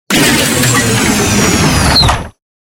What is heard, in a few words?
Robotic Movement; SoundsCrate